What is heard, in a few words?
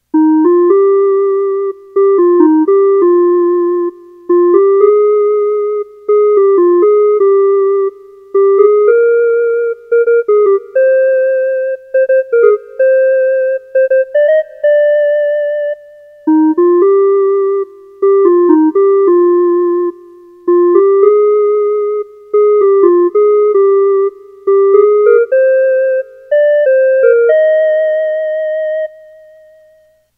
My Osaka instrumental; 関西テレビ; 大阪市提供の広報番組のテーマ曲